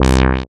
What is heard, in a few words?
SYN MC WAH, Roland MC-202